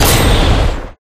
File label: Bow; life of a naomi; Naomi Carrigan